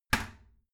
toilet seat lid close heavy, badezimmer

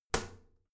toilet lid wall open regular, badezimmer

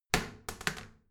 toilet seat lid wall open heavy, badezimmer

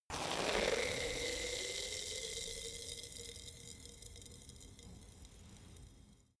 bottle vodka fizz, gastronomie

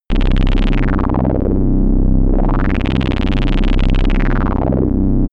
gloomy battlefield, Pulsieren

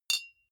deodorant hit soft, metall; soundpool

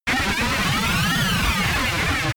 power load, energie

